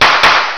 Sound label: Smack Smack